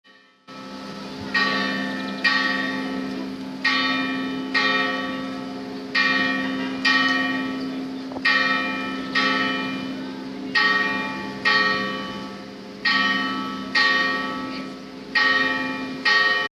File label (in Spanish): campana concejo